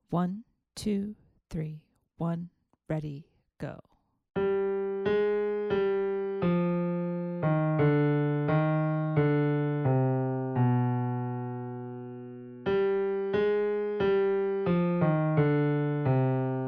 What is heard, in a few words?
melodic dictation